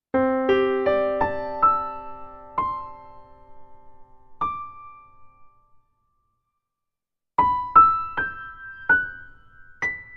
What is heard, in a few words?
pure space piano no ambience